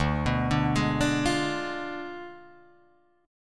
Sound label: D Diminished 7th D down Guitar Drop D